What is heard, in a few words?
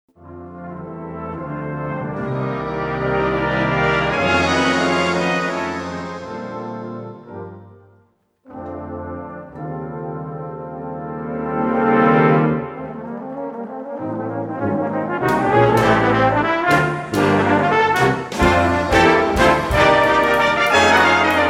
Brass Band